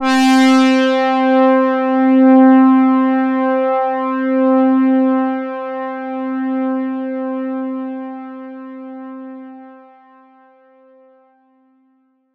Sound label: Oberheim OB-X LEAD Sync - C; Synth; One Shots; Cymatics; Fantasy Synth Sample Pack